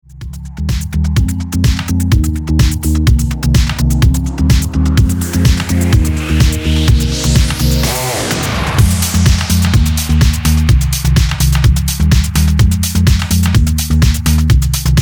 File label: electro deep mix